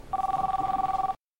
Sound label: Bag Phone, ringtone